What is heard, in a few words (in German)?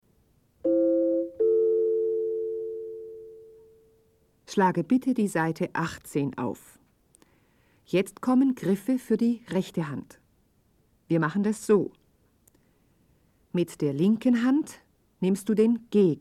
Spiel Blockfloete